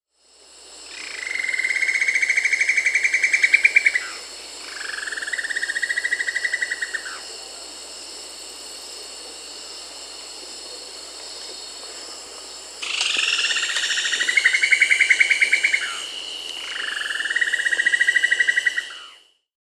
Cinnamon-throated Woodcreeper (Dendrexetastes rufigula), Peru; S. America